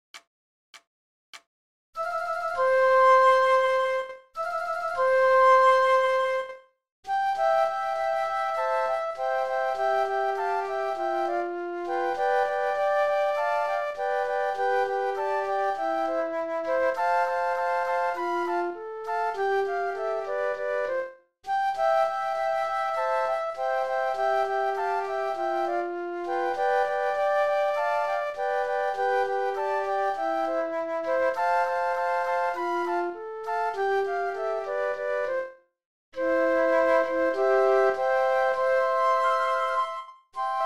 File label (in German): Floeten